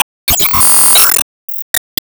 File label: Ingame Rushup; disney star smash android ios mobile gamerip 2020